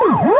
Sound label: EATING